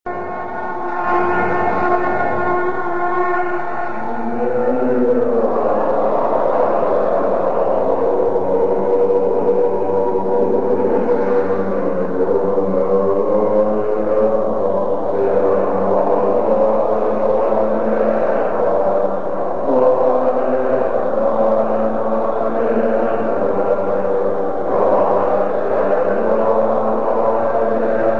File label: monk chant; india